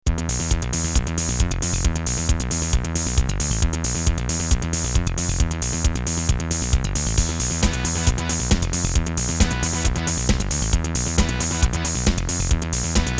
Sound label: Battle; Emotion Creator; Battle 1 [From Gakuensei Hakusho 2]